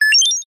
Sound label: science fiction robot small cute chirp